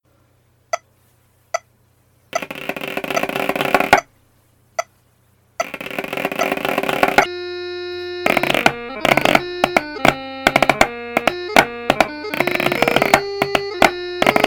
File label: Chanter Snare